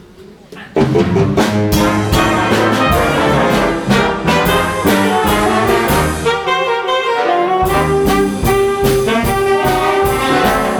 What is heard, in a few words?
jingle bells boogie